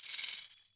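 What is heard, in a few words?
TAMBOURINE SHAKE; Best Service Advanced Media Trax Modern Composer; LATIN PC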